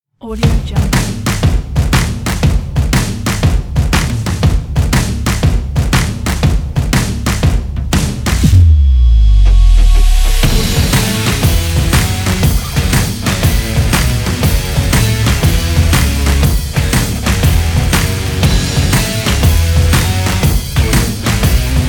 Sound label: driving stomp rock